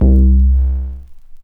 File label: Square Pluck Vinyl Synths C1